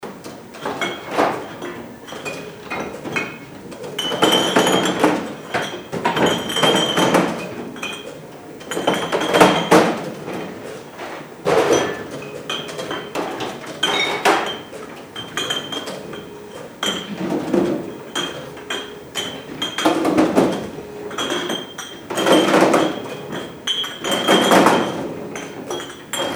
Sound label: bottles pack into cases